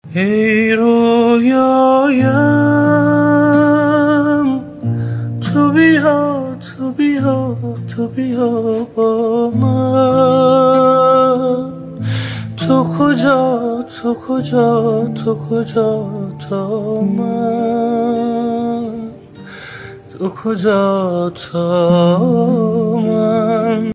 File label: Guitar